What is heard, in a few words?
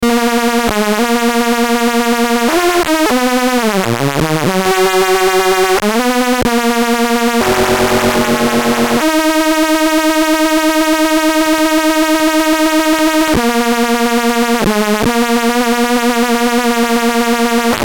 Psynth Lfo